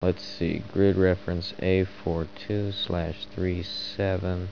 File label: type in